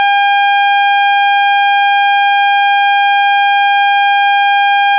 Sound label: 805hz saw